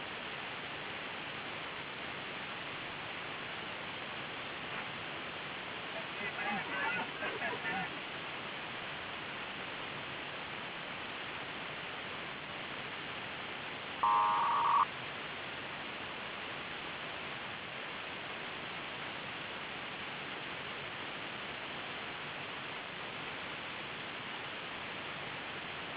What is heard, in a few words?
scream fsk-2 shift 200 hz speed 200 bd